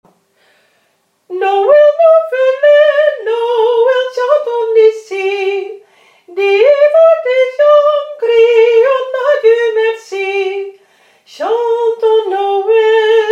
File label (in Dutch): sopraan, vrouwenkoor